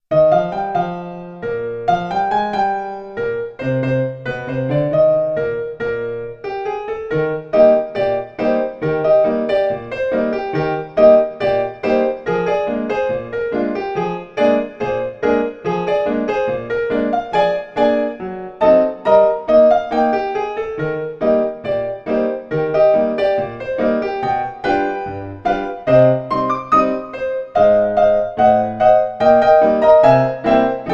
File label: subway play for tips keys; The Sims 3; Windows